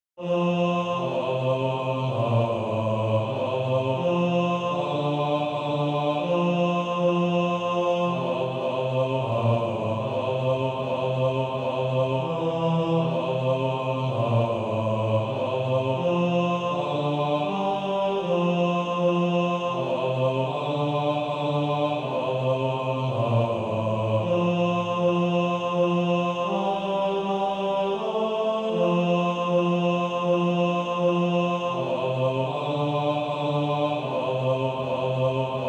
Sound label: Male Choir; He Leadeth Me